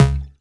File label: Synth Shots; Vengeance Samples